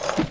Grab Wrench

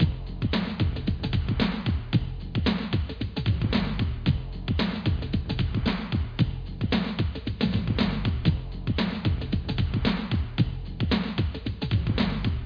GameMusic; bible; matching

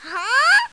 bummer

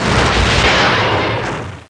crush; INC demo

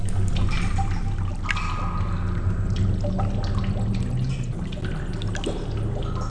CAT AMB; REDNECK; RAMPAGER

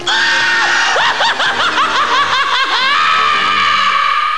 Muahaha

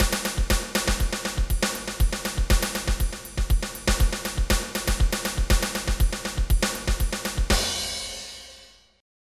SAMBA

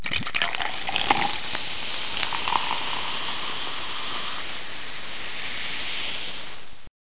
sodacan pour; aroundhome

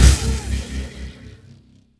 HIT WALL; weapons

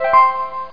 Prize Appears; Maelstrom Sounds

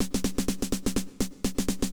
SNARE HOUSE 125; eJay

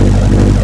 Engine; PC Gamer IT CD 48; Big bang

